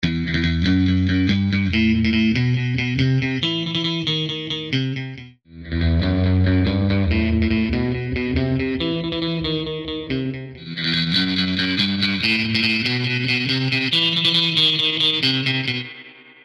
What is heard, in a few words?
twang